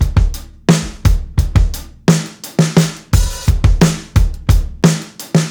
16TH GOOVE, breaks, drums; Basement drums vol 2

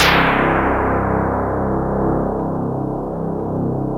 BOING, Electro Perc; E-MU Formula 4000 Series Vol. 3; Analog Odyssey

